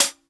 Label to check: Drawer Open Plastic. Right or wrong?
Wrong